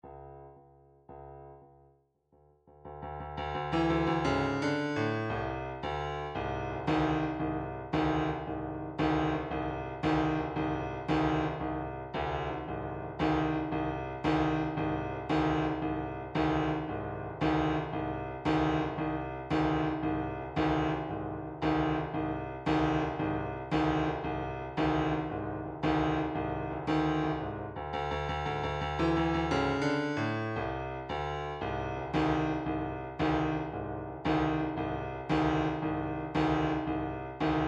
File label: drumtrax blues 114